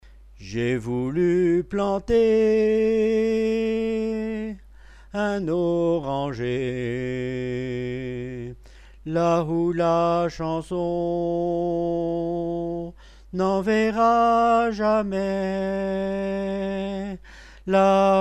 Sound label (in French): soprane